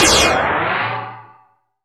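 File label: PER PROCU, Procussion, percussion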